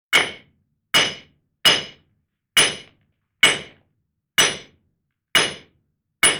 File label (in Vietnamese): am thanh tieng bua dap vao kim loai